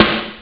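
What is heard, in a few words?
snare drum; Amiga Soundtracker sample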